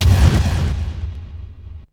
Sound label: drum hit; SoundFactory; SMPMANIA; EFFECTS